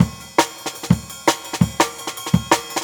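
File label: Jungle Breaks, 168 bpm; Original Jungle Breaks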